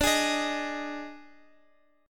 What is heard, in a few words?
D sharp Major 7th Suspended 4th, D